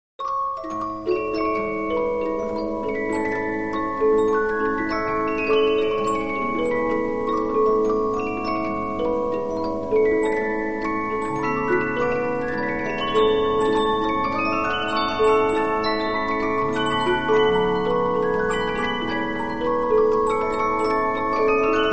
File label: Polyphon, 15inch disc musicbox